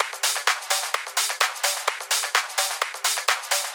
House Loop, 128 BPM; Vengeance Samples

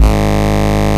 Ultimate Bass G# Root G#; Vengeance Samples

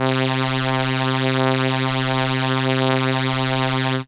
Trumpet C3, ARP Solina; musicradar-synth-samples

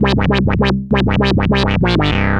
ARP Odyssey, Arp A Line, synth samples

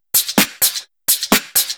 Electro Loop 128BPM; Vengeance Samples

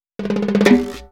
Fill 128 BPM; Vengeance Samples; VEH1 Loops

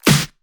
Pre-Shifted Claps; Vengeance Samples

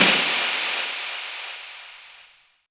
FX Downlifter

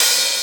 OP Hihat; Open Hihats

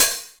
Cymbals OH, Open HH; Vengeance Samples

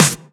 Snare; Vengeance Samples

